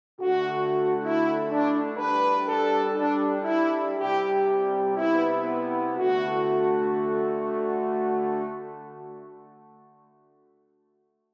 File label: French Horns